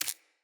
Root Spider Step Dirt, Foley; Animals

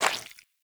Footstep Default; Warframe; Pirate

